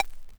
Jupiter4 Bip Vinyl Synths C3; vinyl perc

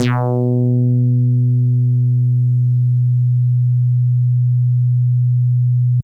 Synthe Bass DX100 B3

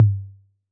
Tom Low B 808, Clean, Digital

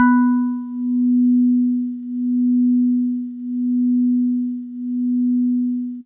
Jazzy Organ DX100 B3

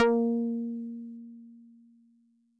SolidBass DX100 As4

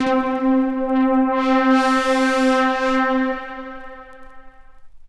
Prodigy Pluck Swell Vinyl Synths C3; vinyl keys

